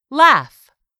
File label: laugh